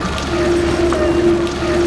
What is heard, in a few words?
WET, WATER; AKAI S6000 CD-ROM - Volume 1